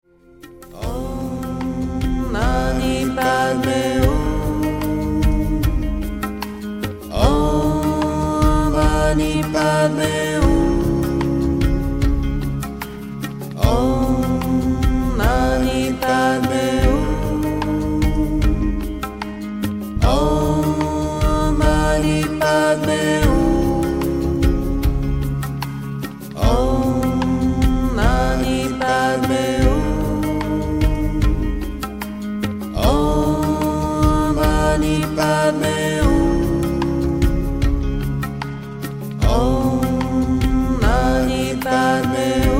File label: OM MANI PADME HUM FA; VERT; LE SOIN PAR LA MUSIQUE